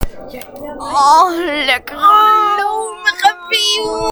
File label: random ahh sound